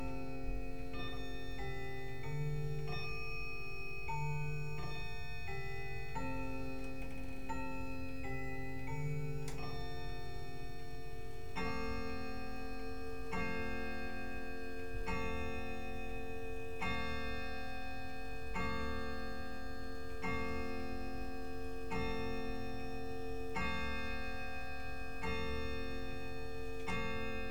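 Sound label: grand clock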